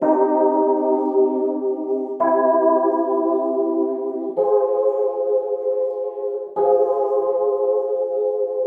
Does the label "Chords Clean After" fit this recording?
Yes